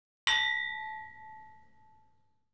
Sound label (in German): anstossen mit weinglaessern; geraeusche digital